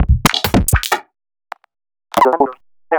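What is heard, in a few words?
Roland MV8800 drum RANDOM KIT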